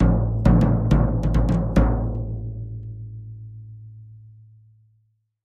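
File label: Drums Toms C Low Accent, drums tom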